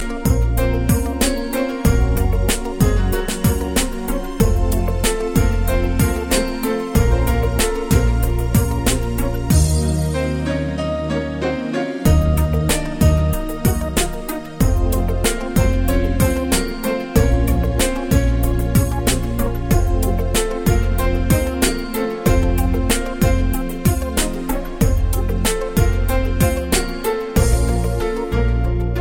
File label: Azeri